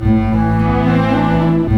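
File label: 108 start, orchestra; East West; Scoring Tools; Start Story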